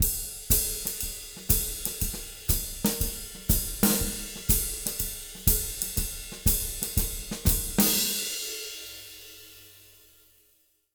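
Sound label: 120 SHUFFLE, Jazz Latin Drumloops; USB Soundscan vol.08; AKAI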